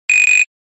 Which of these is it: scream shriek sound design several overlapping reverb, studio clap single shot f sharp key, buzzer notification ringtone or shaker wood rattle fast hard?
buzzer notification ringtone